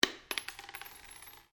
Cartridge 22 Caliber Long Impact Polished Wood Stereo; shell casings of different calibers impacting on different surfaces; SoundEffects Foundation2